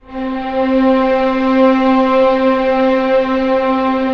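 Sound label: STR C3 PP, Orchestra Strings; Sound On Sound - Peter Siedleczek's Orchestra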